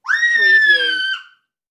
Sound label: Child Scream